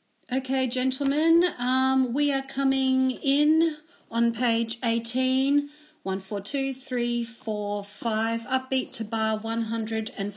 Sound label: tenors; Les Misérables